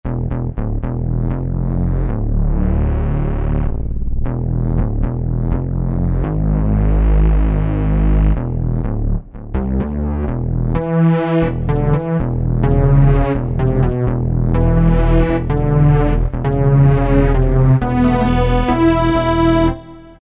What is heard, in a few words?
bass Sluggo, E-mu PK-6